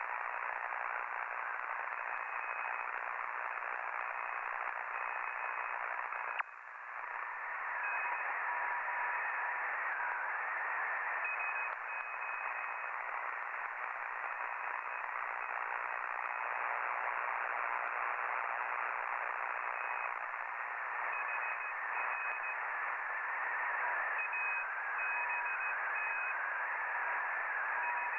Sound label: intermittent noise 7058 ssb; signalink; dealing with local rfi